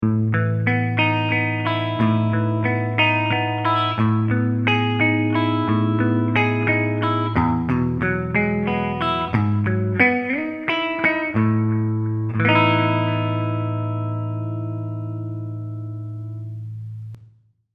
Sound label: Electric Blade